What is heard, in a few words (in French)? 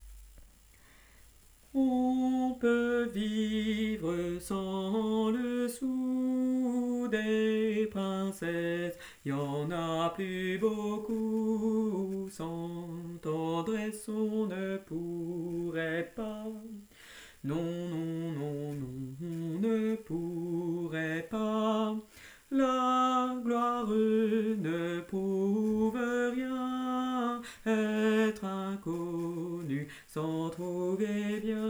hommes